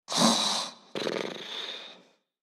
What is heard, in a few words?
Dog Sleeping